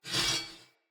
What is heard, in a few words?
Weapon Common Accent; Weapons; Player; Swords; Mockery